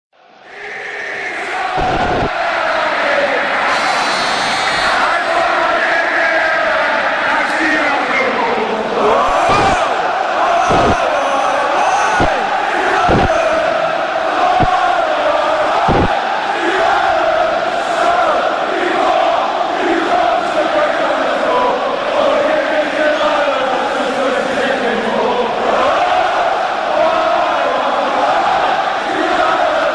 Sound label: applaus, fan chants; Stoke City